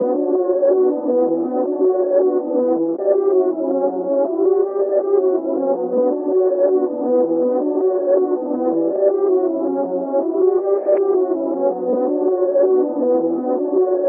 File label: 161 BPM B Min Reverse Pad; Melody; Loop Stems; Cymatics; 2022 Melody Collection; Third Eye